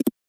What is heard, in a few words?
Lock